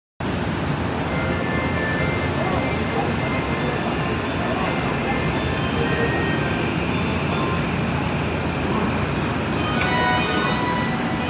鶴橋発車, train sounds

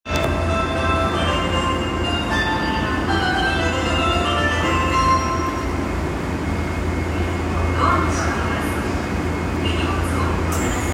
有楽町江戸川橋東, train sounds

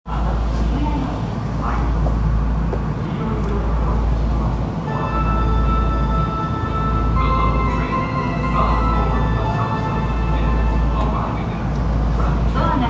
銀座線神田西, train sounds

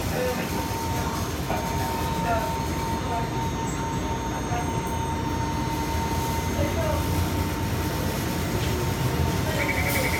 嵐電発車

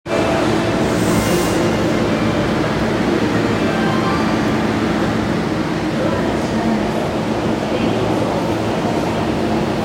千代田線町屋西, train sounds

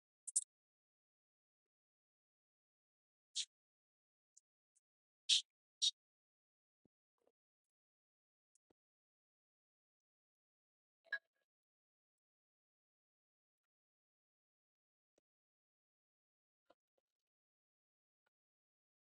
東急目黒線大岡山南, train sounds

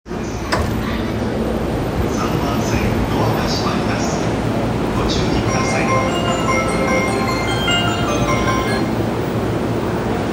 中央・総武線四ツ谷西, train sounds

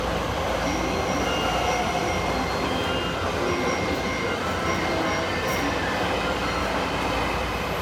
中央・総武線津田沼西, train sounds

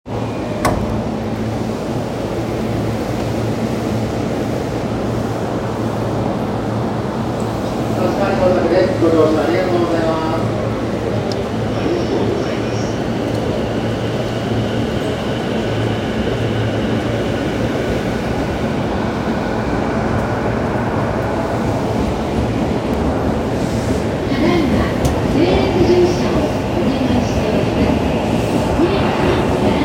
中央線快速立川東, train sounds

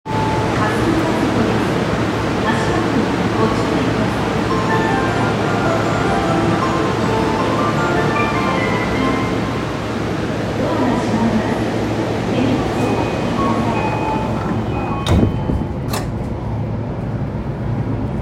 千代田線霞ヶ関西, train sounds

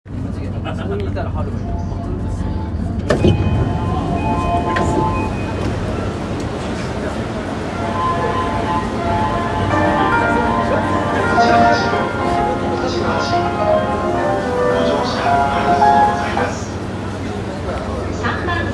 JR山手線御徒町CCW, train sounds

